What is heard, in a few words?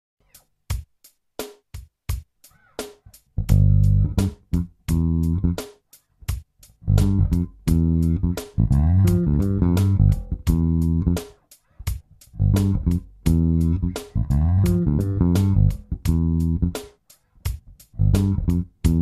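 Slow groove in G, bass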